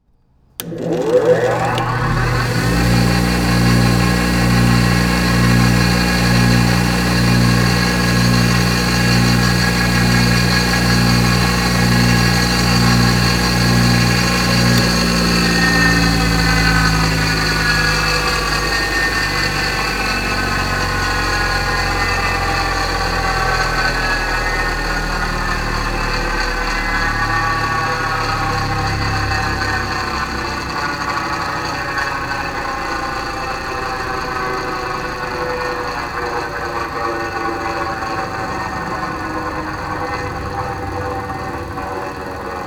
Industry Tool Grinder On And Off

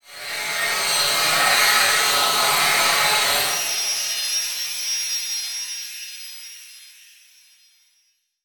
Transition Feedback Sweep; Adobe; Production Element

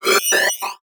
Multimedia Internet CD-Rom Flash Wipe Electronic

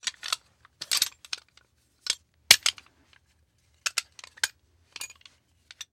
Foley Padlock Shake Movements

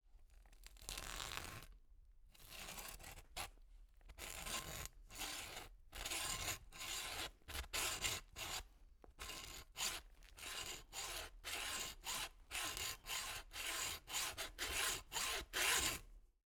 INT Sawing leg bone, Deer; Coll Anderson; GDC Game Audio Bundle

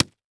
Impact Punch Single

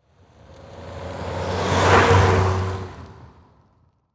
Auto Nissan Altima Drive By Fast; Transportation

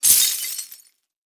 Impact Ceramic Debris Crash On Cement; Impacts

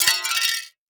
Impact Plate Aluminum Hits Concrete; Impacts

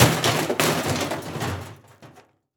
Crash Aluminum Garbage Can Tumbling On Pavement; Crashes

